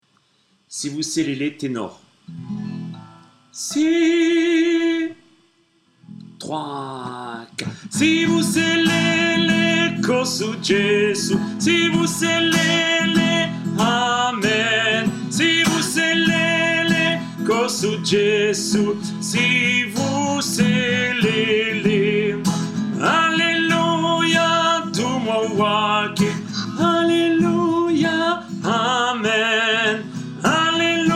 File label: sivuselele tenor